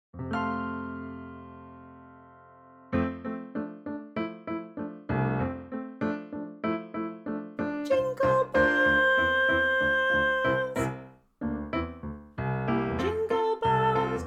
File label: jingle bells vocal; christmas past and present choir celebration 2024